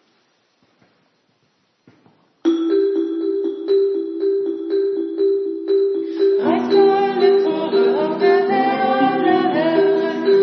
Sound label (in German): Gitarre